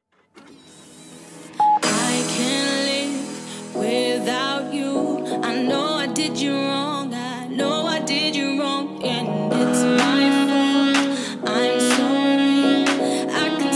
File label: tsax